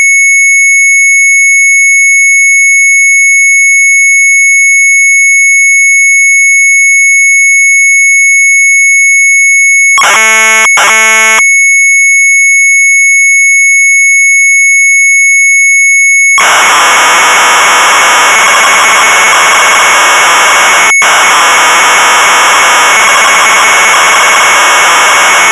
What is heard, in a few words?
MZ800 Tape, sharp; CONVERTEER; retromelsarchive